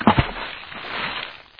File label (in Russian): voda korotkii priglushennii vsplesk